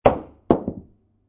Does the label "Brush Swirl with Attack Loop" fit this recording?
No